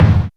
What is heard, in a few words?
original kick drum sound F sharp key